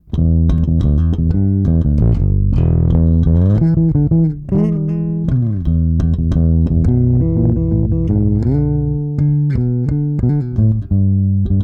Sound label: Warwick DI Post